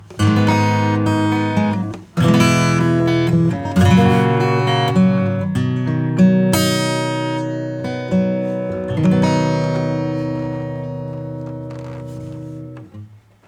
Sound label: Acoustic Preamp; Water2; TH-12 RELEASE E1